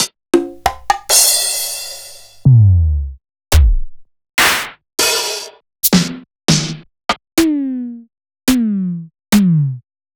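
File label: Roland MV8800 drum D KIT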